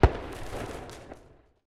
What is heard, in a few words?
firework